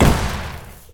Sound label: Shotgun Firing